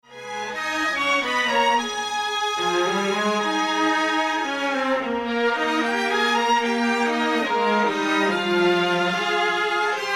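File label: Strings with Ambience; Mix Balance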